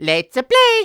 Banner Jingle; Mario Golf World Tour

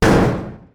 metal hit

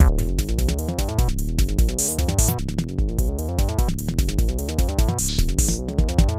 Flesh Tunnels C - Cartoon

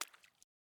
water step

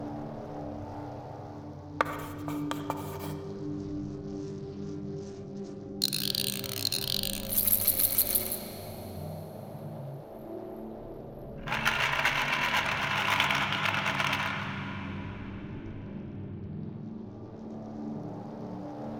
Base Room; Toy Odyssey The Lost and Found

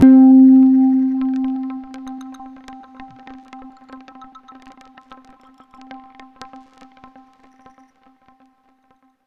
Stinger Puzzle Tone

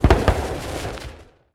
firework

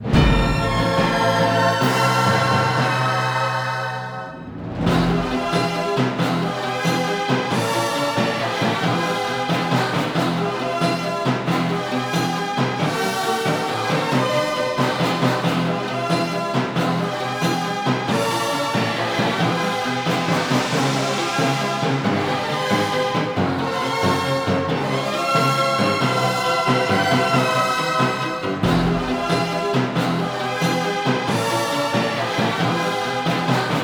Debriefing Lose; Dungeon Hunter 3; android ios

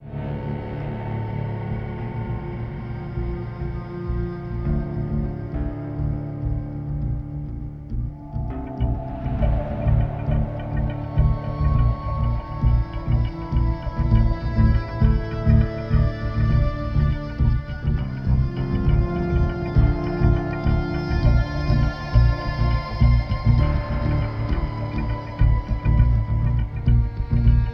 Test Music; Street Cricket Champions; PSP

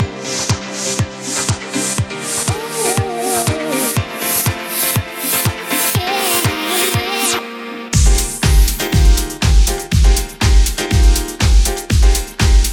Rhythm, 121 BPM, All Stems Assembled; Yum Yum Cookstar

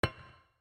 Desert Note Failure C3

